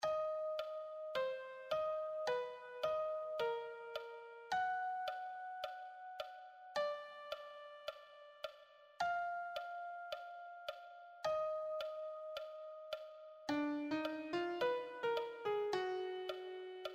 lead, piano

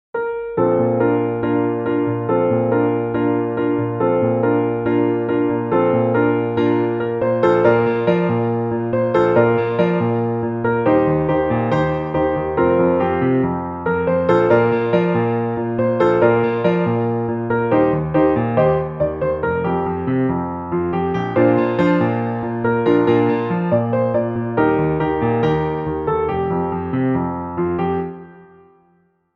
advanced solo, piano

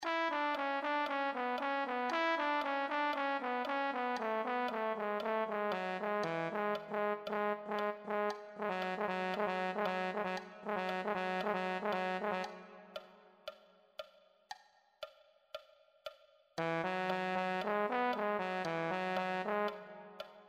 euphonium

